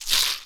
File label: box rattle; Kenya Box Rattle